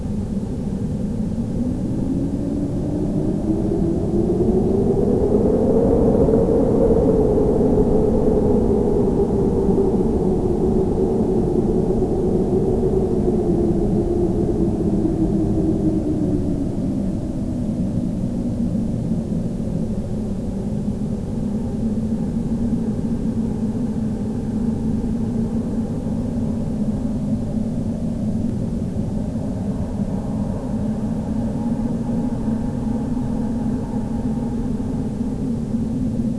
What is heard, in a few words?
wind; fy kheops